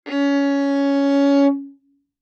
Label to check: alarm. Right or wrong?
Wrong